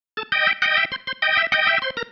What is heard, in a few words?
Single Riff, Organ